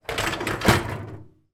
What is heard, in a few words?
household dishwasher wire basket push in